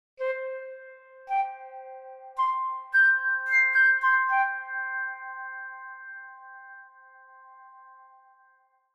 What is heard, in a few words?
Flute Ruckers II FX; H Ruckers II